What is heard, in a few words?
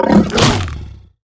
killer tile bite melee; crocs